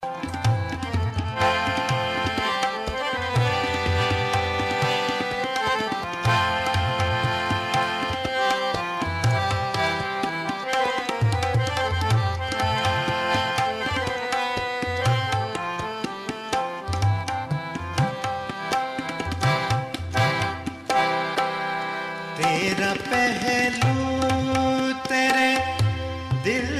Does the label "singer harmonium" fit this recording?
Yes